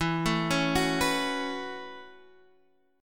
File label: A 6th Add 9th E, guitar